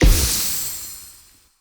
Blood Lust Hit; Fey; Founders Champion

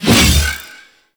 Big Slash Whoosh

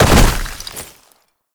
Land Heavy Ice, movement; Warframe; Uriel

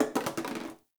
Janitor Cart Plastic Bottle Drop; Warframe; Ambience; NineteenNinetyNine

